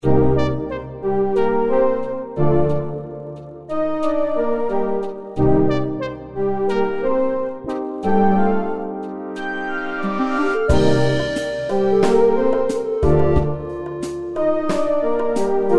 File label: orchestra